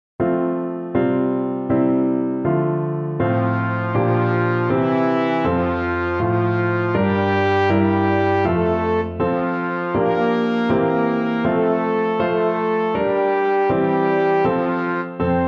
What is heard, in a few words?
trombone